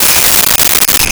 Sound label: Jingle Bells; Nintendo; July 2020 Gigaleak